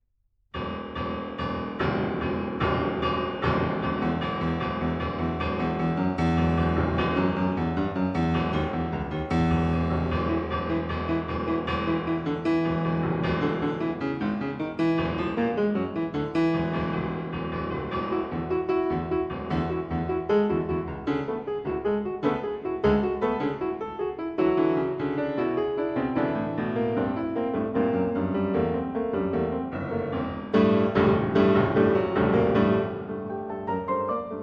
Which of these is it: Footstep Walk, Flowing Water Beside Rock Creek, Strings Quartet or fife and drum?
fife and drum